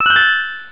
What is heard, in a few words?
dRRRinggg; Burning Rubber 1.2.3